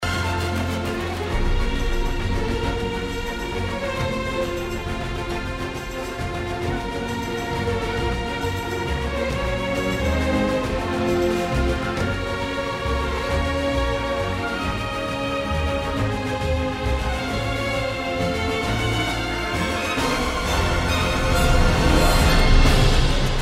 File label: Solo